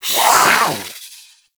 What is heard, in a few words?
Feral Vocal Attack Medium A; Warframe; Catbrow